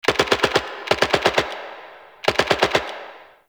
Distant Gunfire

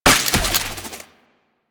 Shotgun Glaive Return Mech; Warframe; Tenno; Alchemist

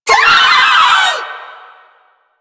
Proto Infested Volatile Runner Kamikaze Scream; Enemies; Abilities; Warframe

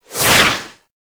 Missile Barrage Whizzby; Enemies; Abilities; Lasrian Tank; Warframe

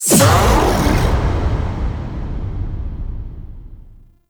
Fight Stage End Stinger; Warframe; Duviri; Kullervo Encounter

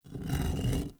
Shrine Puzzle Stag Turn; Doors

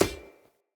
Unarmed Fey Parry Impact

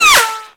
Bullet Whizzby; Warframe; Weapons

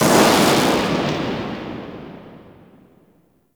Electricity Blast; Warframe; Ambience; NineteenNinetyNine

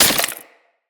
Melee Impact Glass

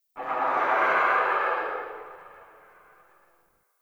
Tree Distant Critters Angry; Ambience; Warframe; Duviri

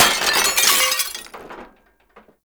Desktop Glass Metal Destroy; Warframe; Entrati

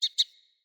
Sparrow Double Chirp; Animals; Vocalizations

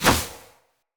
Punch Whoosh; Weapons; Unarmed; Fey

